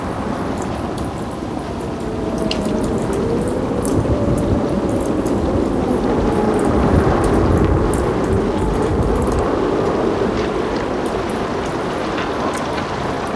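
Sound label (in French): hiver, winter; MOBICLIC 13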